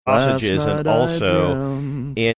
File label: singing